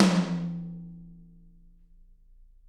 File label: TT, Toms; Bob Clearmountain Drums I